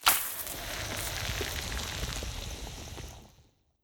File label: Plant Acid; Legend of Keepers (gamerip) (2019)